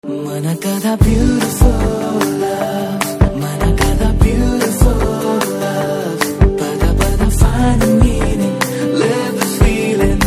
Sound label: Ringtone; Naa Peru Surya, Naa Illu India; Telugu